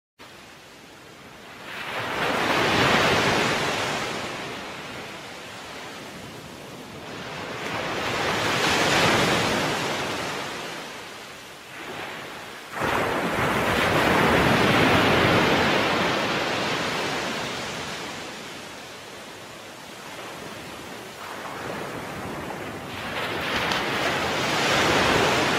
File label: ocean sound sea sounds; 30 minutes; relax meditation zen music sleep; sleep with the ocean sound